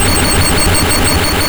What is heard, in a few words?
Thunder Shock; Pokemon Essentials; PKMN Estelar 1.3